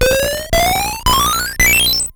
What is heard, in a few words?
die, ATARI